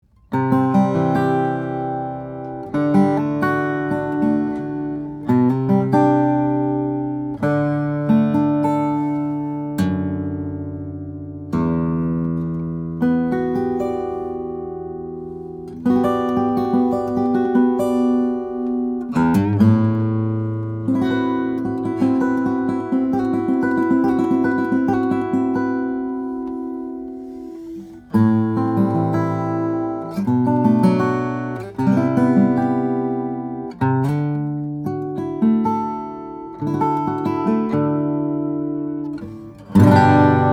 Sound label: guitars, steel-string guitars, flattop; Spohn 00; fiddleback mahogany; swiss moonspruce